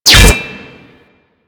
Bolt Impact Metal; Warframe; Weapons; Cross Bolt

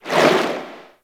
Shark Tail Move Swim; Warframe; Grineer Ocean Shark

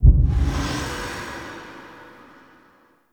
Device Transition Close; Sacrifice Vitruvian; Warframe; Quests; Chamber

